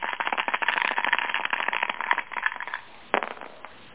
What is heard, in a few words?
dice; HBMonopoly